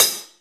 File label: closed, Drums Percussion; Drum Bass; Zero-G; Total Drum Bass